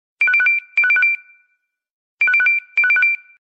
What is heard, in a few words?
Double Bee Beep